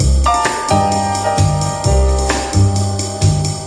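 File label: groove piano, 131 bpm; MELODIC LOOPS; SAMPLED MUSIC LOOPS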